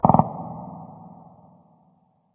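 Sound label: distant machinegun; random amb sfx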